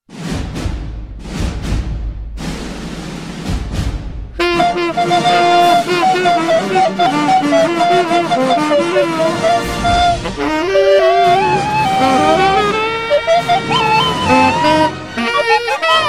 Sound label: Flute and Sax Fanfare